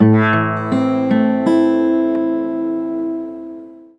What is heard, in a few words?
LUTE